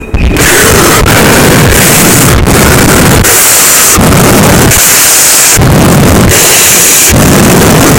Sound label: breathing